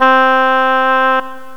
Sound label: oboe, reed; gmplay